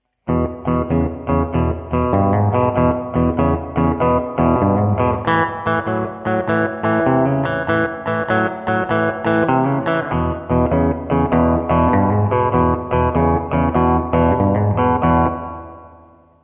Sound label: rhythm slow, 12bar